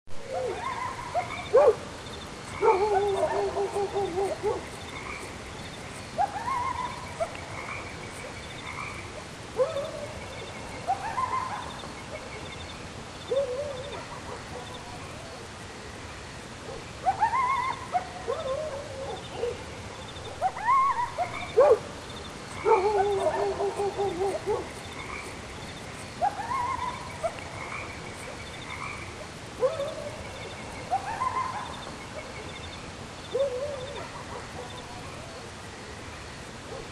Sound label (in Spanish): Duende (Ambiente); Abrapalabra La Magia de Aprender a Leer